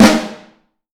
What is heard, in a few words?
Vintage Snare Drum, 5X14 LEEDY; Q Up Arts; Johnny.C's Vintage Snare Drum